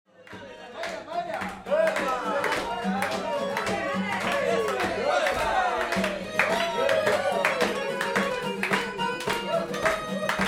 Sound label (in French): La rumba de Paris bar